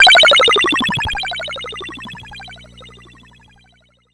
C64 Mutation Gun; 8-Bit; Chiptune; Commodore 64; FX

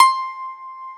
Baglama C5

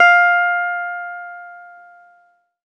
ZITHER; F2; Best Service; Alpine Volksmusik

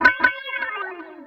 C#min, Guitars; Total Drum&Bass

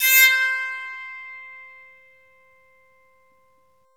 BOWED PSA, Bowed Psaltry

